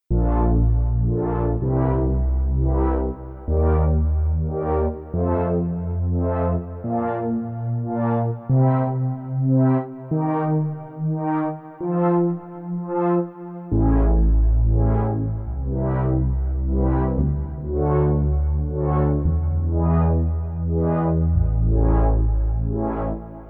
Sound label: gaussian chord